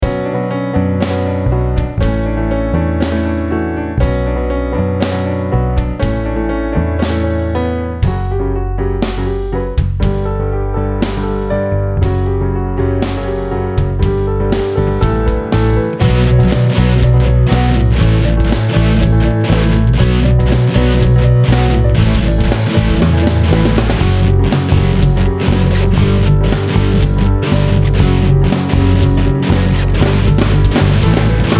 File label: Pianny